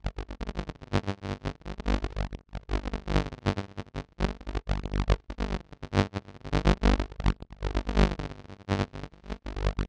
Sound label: accumulator discharging; factorio 2.0.55